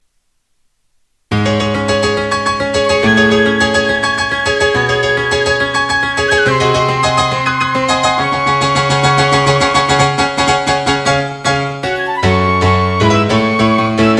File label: concertino piano